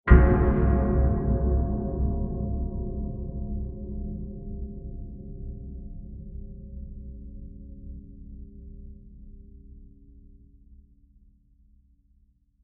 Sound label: Long Diffuse Metal Impact; Blast Sound Effects; Blasts (Sound Effects:Impacts)